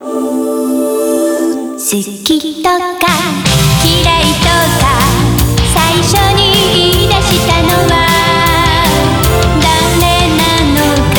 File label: BGM Reach